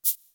HIP HOP, ENSONIQ TS-10; Rubber Chicken Software Sample Bank

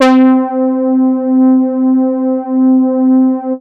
MOOG BASS C4; Best Service Dream Experience

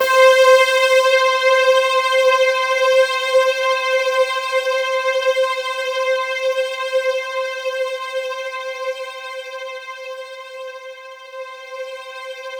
C4, Roland JV 2080 CLUB ORGAN

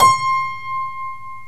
TACK PIANO

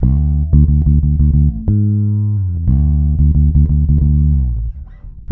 D, bass; Spectrasonics; Bass Legends CD 1; S.BRONX

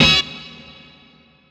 old skool stab

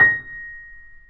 A#5 off, Grand Piano off mic; AKAI S6000 CD-ROM - Volume 2

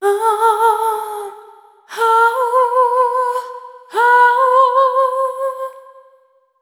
Single Voice Melodic Loops, Vocal Samples; Pocket Diva World Class Vocal Samples

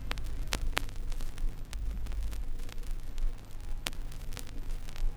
Crackle, Vinyl Noise

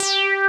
303 ACID BS; Pro-Rec - Synthsonic; BASSES

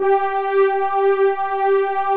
smoothies, pads; Pro-Rec - Synthsonic

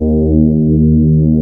CMI ORGAN

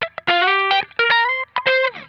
GLIDE, Funk Guitar; Zero G - Funk Guitar

